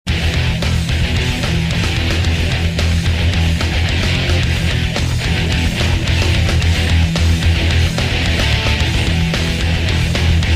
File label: Heavy Metal; honduras musical 9no grado